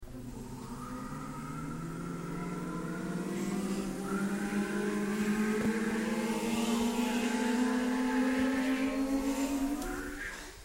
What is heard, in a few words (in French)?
acceleration